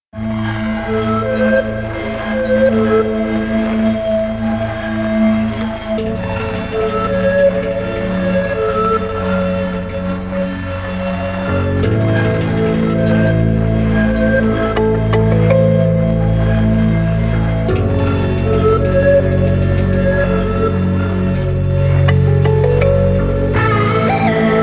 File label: Tension Mystery